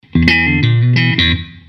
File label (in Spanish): Riff rockero